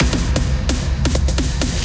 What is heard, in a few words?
To Battle Faster Transition; Pokémon Trading Card Game Live